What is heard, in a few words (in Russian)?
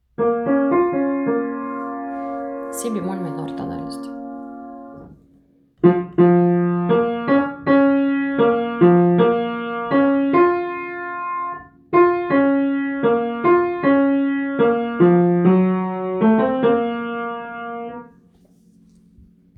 diktant b-moll; 8 klass